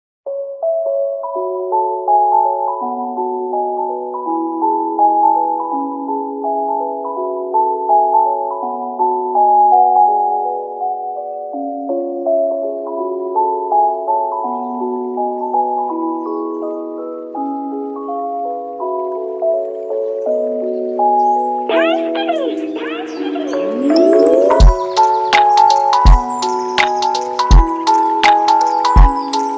piano

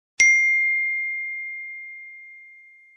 Iphone Ting Message Tone

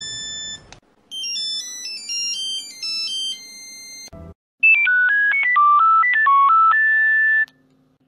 Nokia Tune

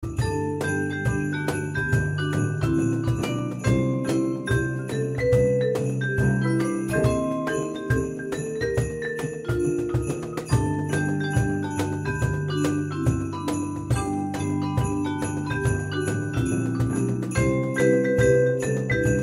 Marimba; Christmas

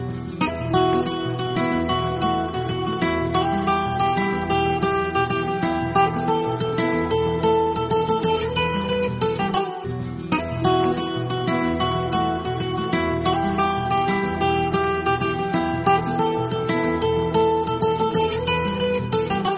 Guitar Bit

